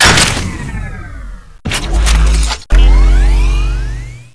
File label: reload energy